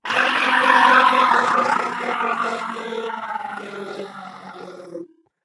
ballbot fall from height

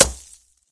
hit wood; weapons; source engine; dod

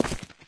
concrete, player footsteps

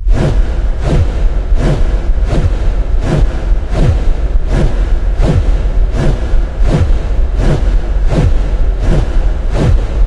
fan; video game; Source Engine; tf; lair

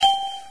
ding, windows 9x; operating systems

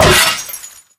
robo impact soft; source engine; tf; mvm; physics; video games

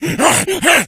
rage, infected, male

